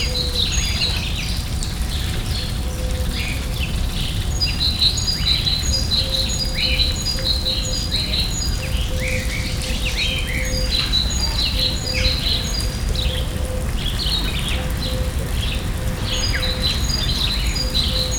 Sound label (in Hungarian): csendes idoszak, gyori zoo hangulat; gyori zoo 2014